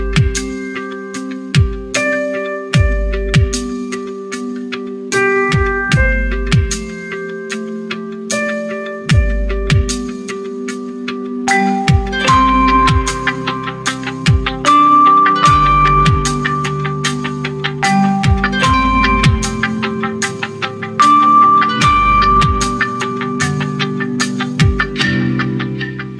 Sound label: Oppo memories Reno 2 Ringtone; oppo zil sesleri